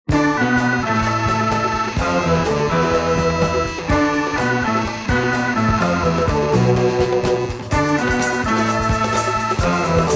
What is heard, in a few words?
motorcycle funk